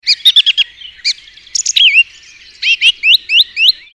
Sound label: Song thrush